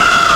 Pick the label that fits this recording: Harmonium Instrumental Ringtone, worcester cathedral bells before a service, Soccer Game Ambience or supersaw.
Soccer Game Ambience